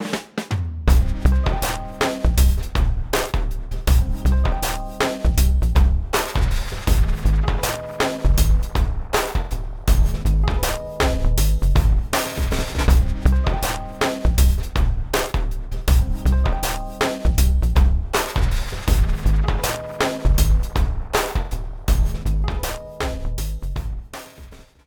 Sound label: Rap Loop; The Jackbox Party Pack 5; Jackbox Games